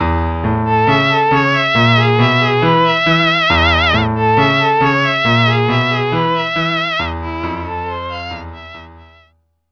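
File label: violin cello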